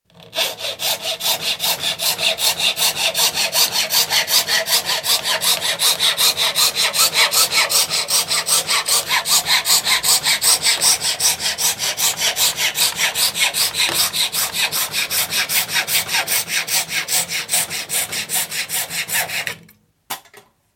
Hacksaw, small piece of Formica, cut piece falls on floor; BBC